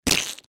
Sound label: IceBall Splashed; bob espo